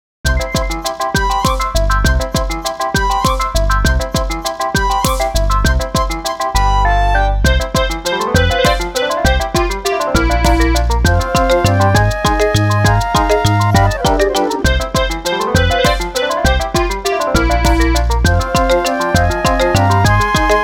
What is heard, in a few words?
Waltz; Tenkuu no Symphonia